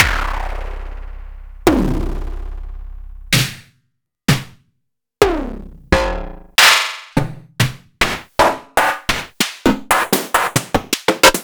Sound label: Snare c